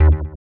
Bass, One Shots; Touch Loops; BlissedOutBeats

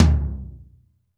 dry tom, acoustic set; drum

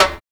reggae snare drum; SNAREDRUMS

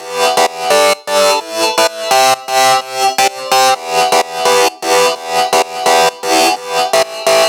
synth 128 Em; Electro; Anthemic; synth loops

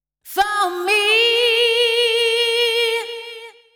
deep house female, 125, A, vocals

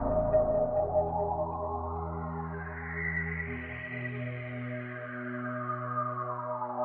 TECHNO PAD 140; Keys

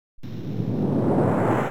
HOUSE FX 125

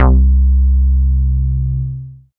BASS MONO

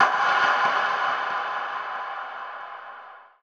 TECHNO FX 140

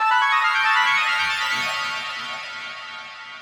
TECHNO EXTRA 140

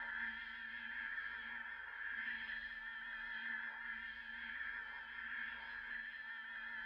SPACE PAD 140 A; SpaceSounds

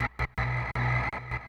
Industrial 160

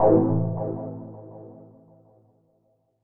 DEEPHOUSE ORGAN 125 A

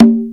CONGA LO; drum; PERCUSSION

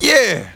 "YEH"; VOICES

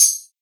hat; Anthemic

